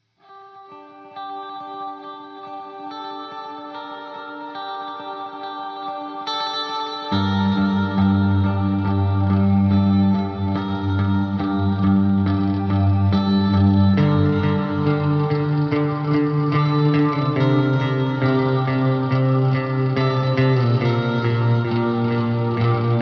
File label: No Pads